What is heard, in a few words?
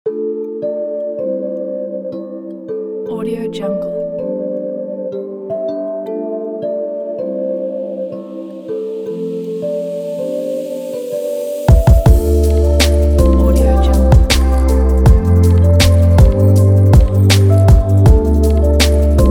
abstract beat; afonemusic